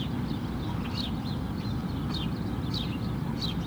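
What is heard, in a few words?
ambience bird; portland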